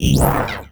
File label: Battery Deactivate; Cowgirl